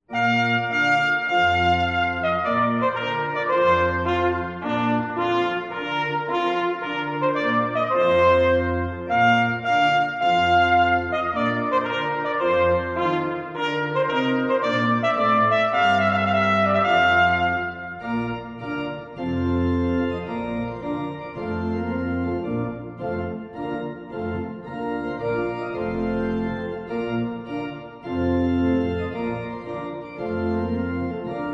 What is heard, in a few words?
Trumpet Tune